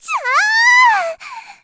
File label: attack; Eternal Return; Tia; Voice Line; KOR